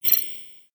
kids game notification hud negative computer chime chirp